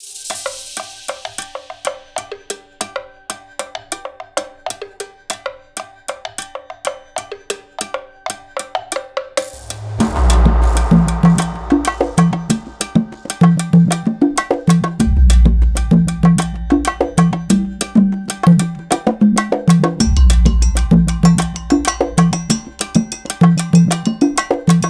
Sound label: rare percussion; percussiongodz vol 2